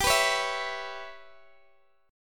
G 6th Flat 5th, Guitar, Open D

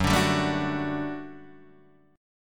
G Major 7th Suspended 2nd F sharp

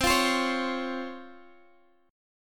C sharp Major 7th C

